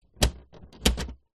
electronic typewriter plastic paper access flap movement; office; brother ax 450